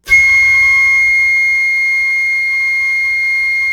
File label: FLUTE FF C7; Greytsounds; Philip Wolfe David Crozier's Saxophones